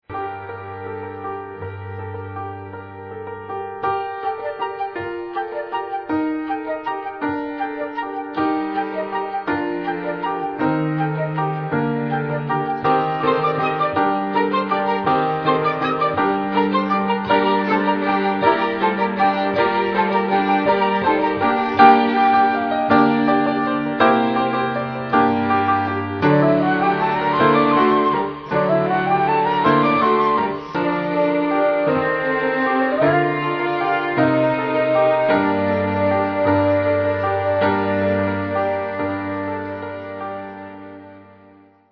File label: carol bells, Light jazz music